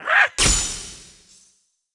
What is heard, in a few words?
Merling Attack; Hi Merling Attack; Valthirian Arc: Hero School Story 2; Xbox Series X/S